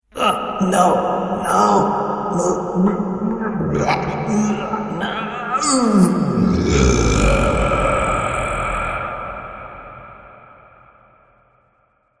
Man To Mutant Transformation, monster sound effect; Andrew Fleming